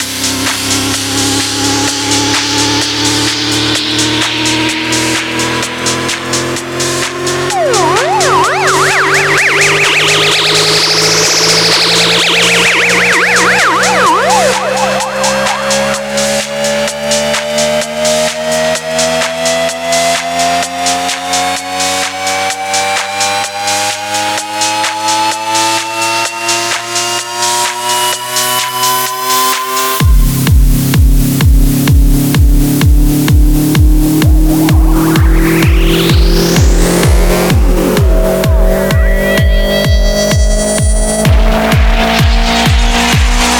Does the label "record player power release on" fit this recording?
No